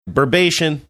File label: burbation